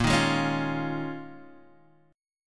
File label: D sharp 7th Suspended 2nd Suspended 4th A sharp, Guitar